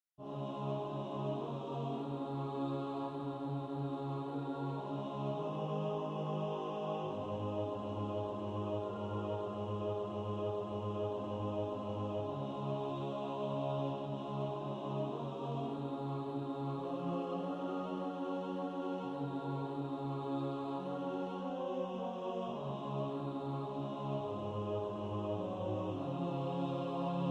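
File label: Under His Wings ten, tenor, choir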